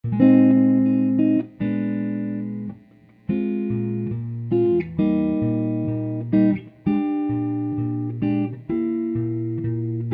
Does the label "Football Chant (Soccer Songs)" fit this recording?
No